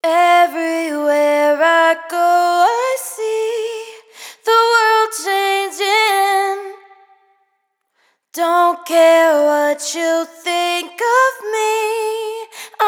hook Everywhere I Go 115 Dm wet, Vocal; Slate Digital Omnivox Vocal Sample Pack

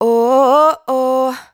"Oh Woah", adlib, Dry Vocals; Raw Vocals; Slate Digital Omnivox Vocal Sample Pack; Adlibs

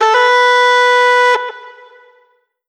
Vocal Sample Pack Phrases B

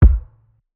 kick thud; Drum Sample Pack; One Shots; Slate; SEISMIC

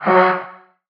vocal top, F#; SD AURORA Sample Pack; Slate

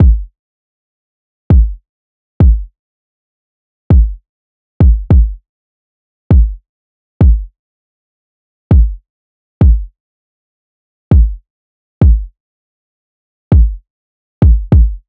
kick 100, Kick Loops; Drum Sample Pack; SD SEISMIC; Slate

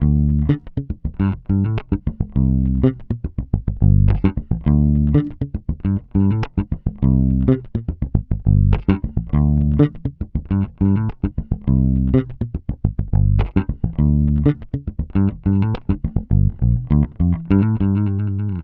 Bass loop 103 Dm, Electric Bass Loops Direct; Slate Digital BASSHOLE Sample Pack

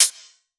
hat club, Closed Hat; Drum One Shots; SD MIDNIGHT Sample Pack; Slate

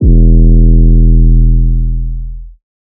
808 Bass Nextdoor E3; Slate 808 Deep Dive; SD Signature 808s

